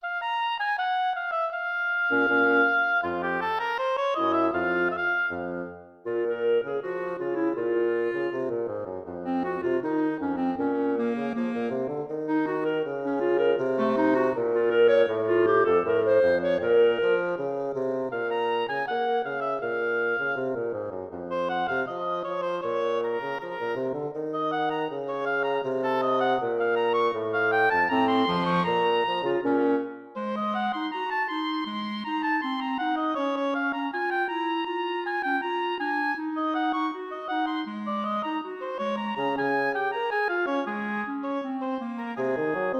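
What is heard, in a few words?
oboe clarinet bassoon; acorazado rivadavia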